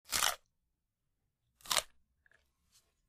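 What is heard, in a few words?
biting crunch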